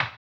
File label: HAMBONE, Vocal Blues Soul; Spectrasonics; Planet